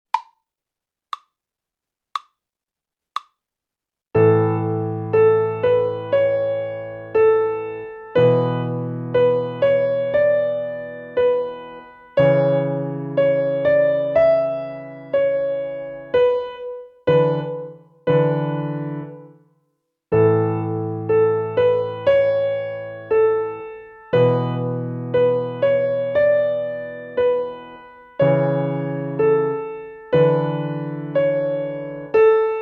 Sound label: Folk Dance slow